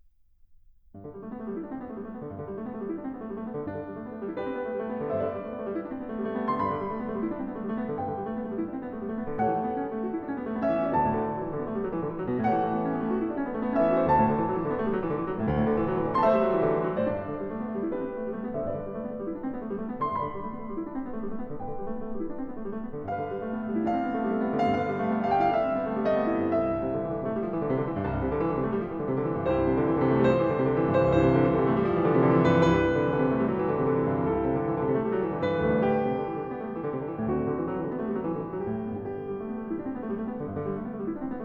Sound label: Prelude op.28 no.3 in G major, Piano; Chopin; Preludes